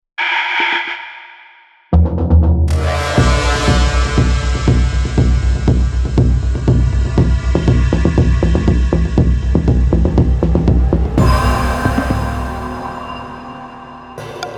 trailer soundtrack; adam pietruszko

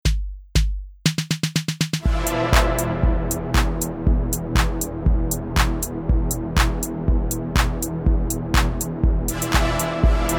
Pad; Ultra Analog Session; Pad Master

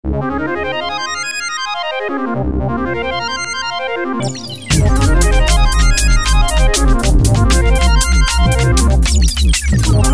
Phrase DnB; UA Masterclass; drum and bass

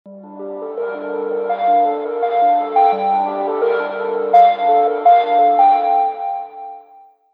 Spook Wave EP, trip hop; UA Masterclass

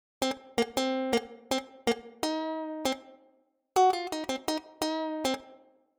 Electric Slappy Guitar; SS Masterclass; Damper

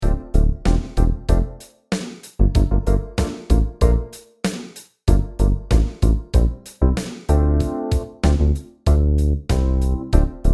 Disco Sub, Funk Disco Basses; UA Theme Packs

